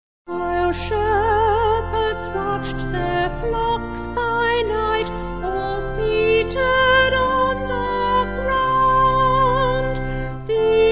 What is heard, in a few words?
While Shepherds, Vocal Organ; Vocals & Organ; 6/F